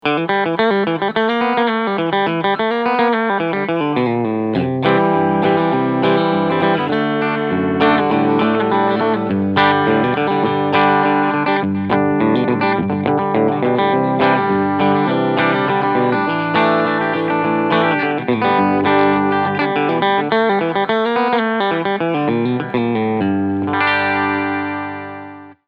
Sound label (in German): Cleansound Steg PU